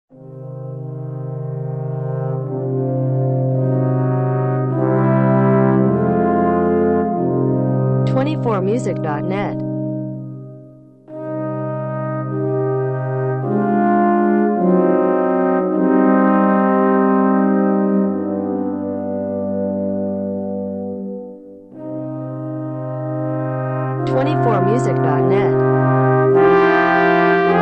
aequale for 3 trombones; bruckner

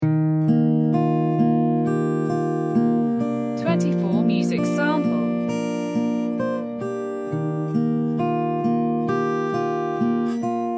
lounge

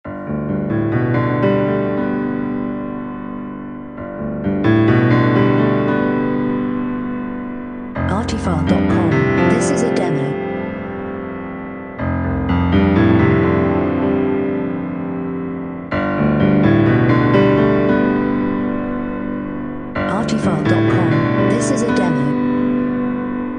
Meditation

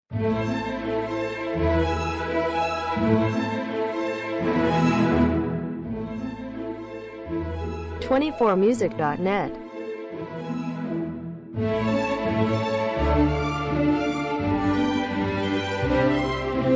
paganini arpeggios

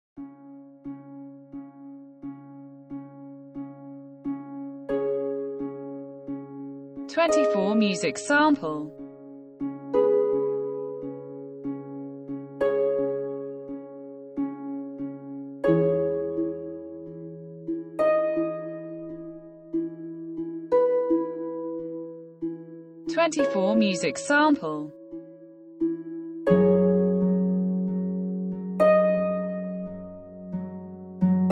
sweet life harp sample

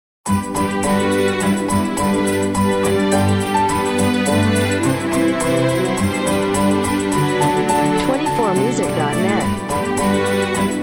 jingle bells

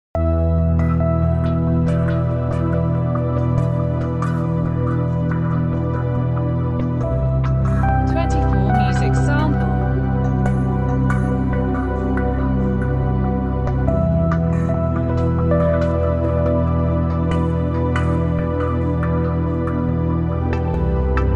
synth

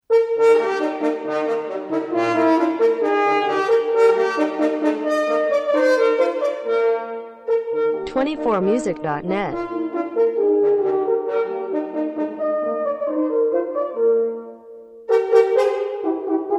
duet for horns allegro; mozart

